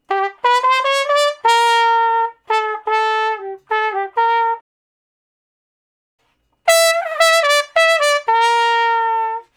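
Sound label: Trumpet Slow Blues (G I, IV, IV); Solos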